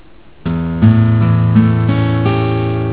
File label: A7 chord, guitar